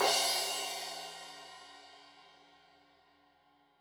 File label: Crash 15in L edge, Cymbals Stereo